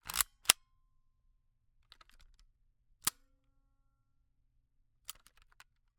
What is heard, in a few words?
Camera, Kodak Extramax, pocket, 110 film, plastic, metal, advance film, snapshot, handling; Eiravaein Works; Vaeyan IV